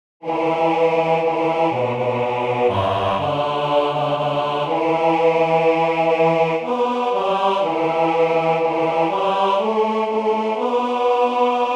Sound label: Bass; Scarborough Fair; England